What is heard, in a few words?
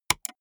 gaming mouse left click single hard